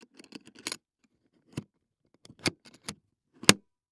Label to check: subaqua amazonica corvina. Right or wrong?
Wrong